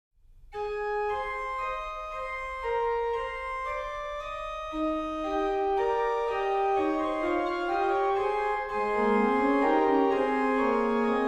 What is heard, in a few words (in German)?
Herr Jesu Christ dich zu uns wend, Bach Organ Works Chorale Preludes; Individual Chorale Preludes